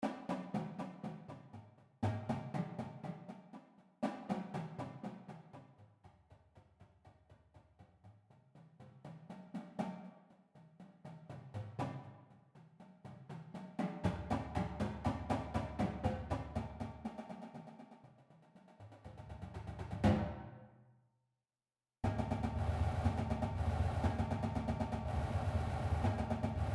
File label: drumline warm ups